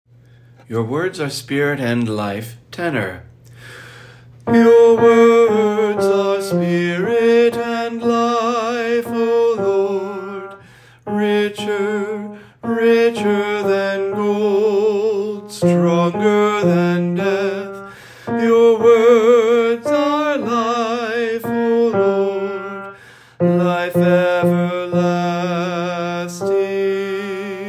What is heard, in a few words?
Tenor, choir music; St. Anthony of Padua; Choir Sunday